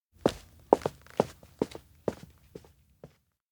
steps indoor leave; meguriai; fumiya; anemoi パッケージ版 1.1

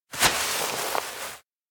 slide on moss; anemoi

